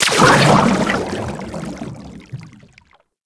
Boost Thruster; Warframe; Sharkwing; Vehicles

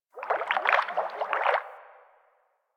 Fish Tank Movement; Warframe; Liset; Hub; Personal Quarters

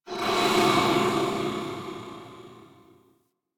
Ship Metal One Off; Warframe; Corpus Ship Remaster

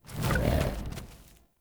Wolf Steps Run Start; Animals; Foley Actions

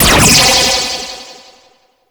Energy Gate Hit, Laser; Space Trech Run

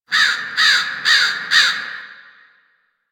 Fall Crows; Warframe; Ambience; Nineteen Ninety Nine; DE

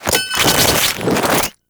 Grenade Prep; Warframe; Lasrian; Lasrian Soldiers; Weapons; Lasrian Goonadier Grenade

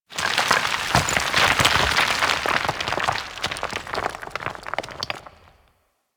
Coring Rock Pour C; Warframe; Ambience; Grineer; Grn Intermediate Coring

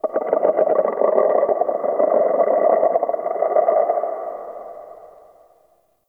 Texture Low Croak; Ambience; Dungeons; Under City

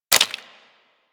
Bullet Impact Debris Wood

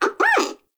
baby vocal

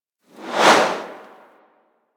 Grenade Projectile By; Grineer

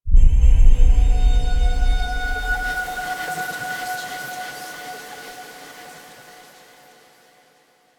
Vista Stinger; Entrati Labs

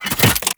Briefcase Launcher Reload Passive C, Weapons; Warframe; Corpus

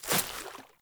Water Step; Characters; Ambient; Steps; Sprout Farmers

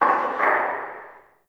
Fort Corner Tower Stone Creak; Ambience

